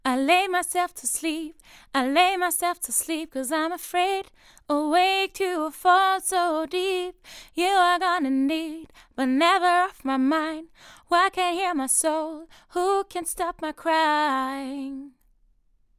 vocals

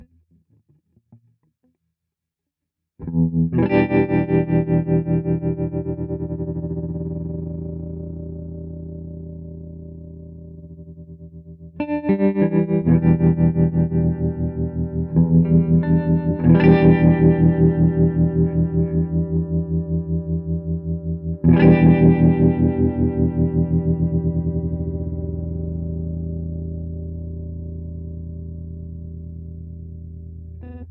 ramp up std harm

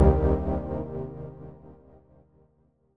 lfo volume

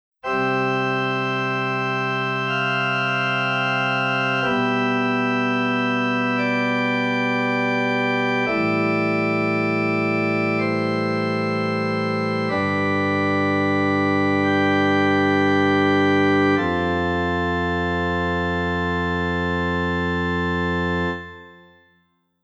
PX S5000 pipe organ

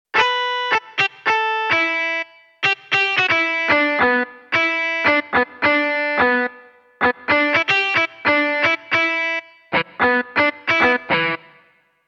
Casio SA 80; Guitar

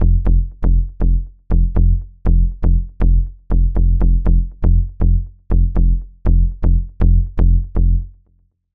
air vaccum pro bass; AIR Vacuum Pro

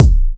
Kick

